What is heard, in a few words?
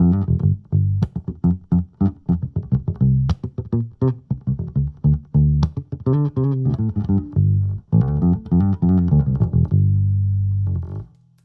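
Fingerstyle Speaker, guitar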